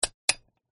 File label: light on